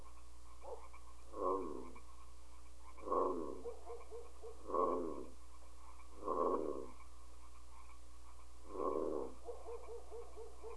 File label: Bullfrog; Garden